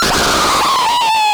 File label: BRAKING, Anime Sound FX; COMIC